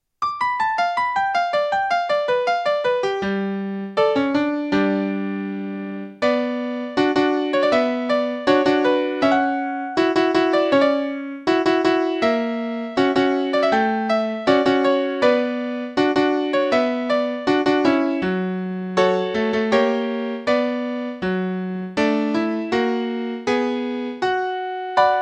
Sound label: Jazz Classic Fantasie